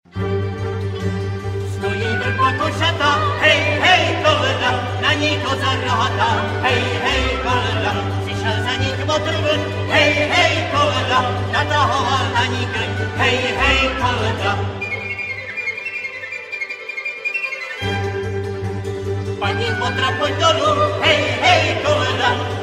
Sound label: hled male jezulatko; 23. Stojí vrba košatá